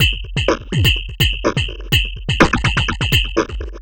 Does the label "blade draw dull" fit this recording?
No